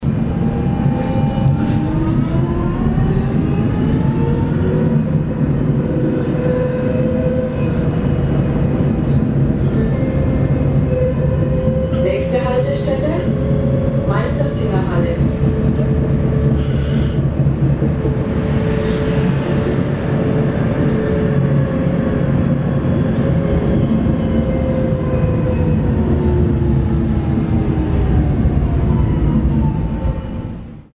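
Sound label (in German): Straßenbahn Nürnberg; Nbg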